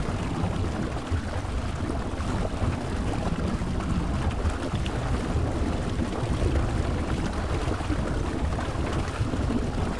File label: Ambience lava